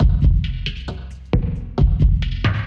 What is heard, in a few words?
Kit - 135 bpm, Drums; Eggs Of Satan